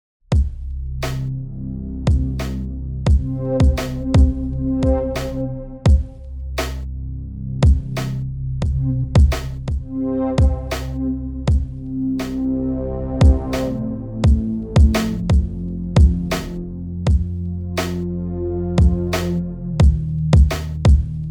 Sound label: dark beat; Lange Donnerstage Cao Fei